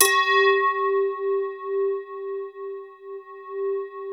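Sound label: glass tubes; Sound Gallery - Charged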